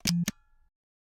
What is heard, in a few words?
Old Camera; Samsung Galaxy S26 Ultra; Retro